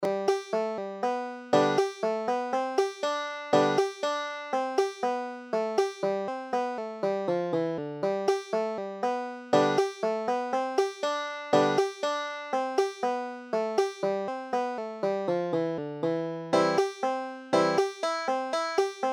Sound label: banjo